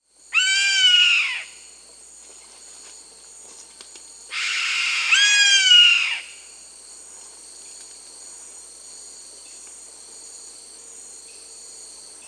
Black Caracara (Daptrius ater), Peru; S. America

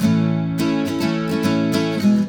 Guitar A, Acoustic

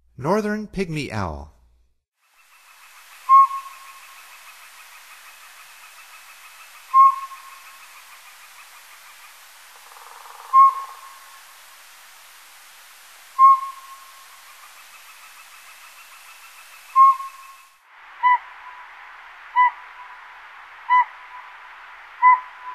Northern Pygmy-Owl; North America; Stokes

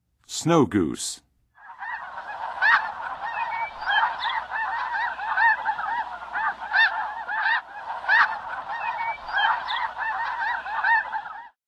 Snow Goose; North America; Western USA; Western USA Songbirds

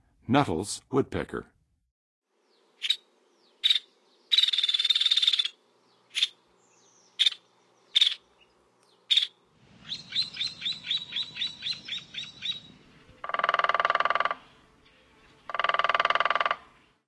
Nuttalls Woodpecker, Songs of Birds; North America; Western USA; Western USA Songbirds

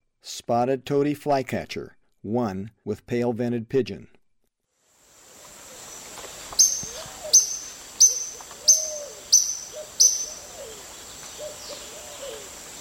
Spotted Tody-Flycatcher; S. America; Eastern Lowlands

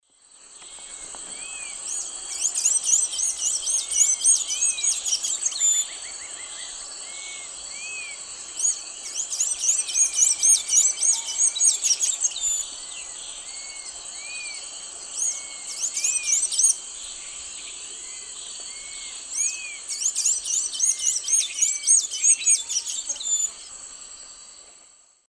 Thraupis episcopus, dawn song; S. America; Eastern Lowlands

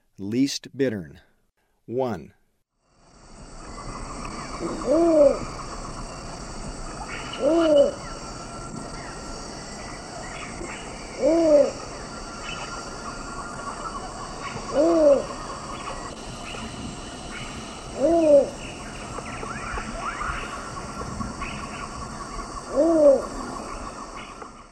Least Bittern; S. America; Eastern Lowlands

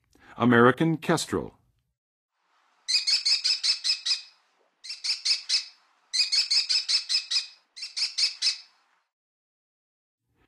American Kestrel, Songs of Birds of North America; Western USA; Western USA Songbirds

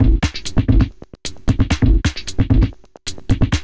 Processed Drumloop

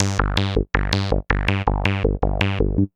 Tech Bass; Rock; Synths & Keyboards

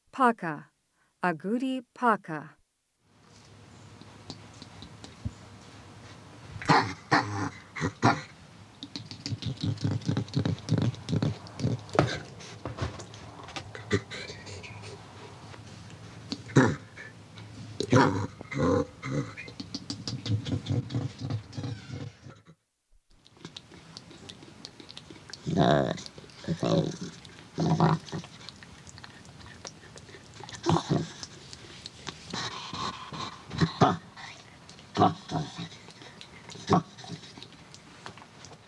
Paca, Mammals of the Rainforest; S. America

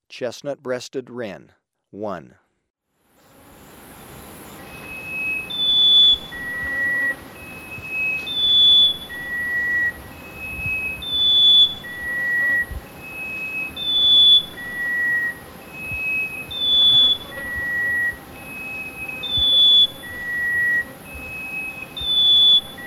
Chestnut-breasted Wren, Birds of Ecuador; S. America

